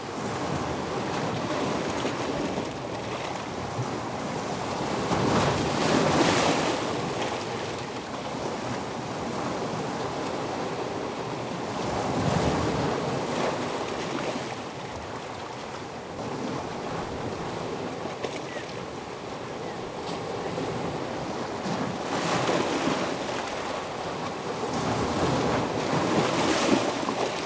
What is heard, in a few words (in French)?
mer rocher; rocher2